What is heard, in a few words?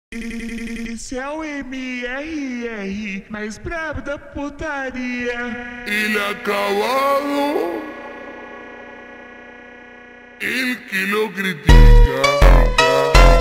funk slowed